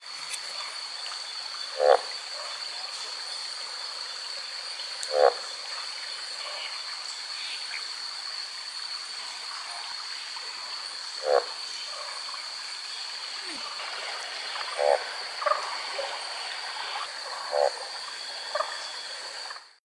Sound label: mixophyes iteratus